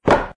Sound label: Lumber Contact; doodle jump 2; android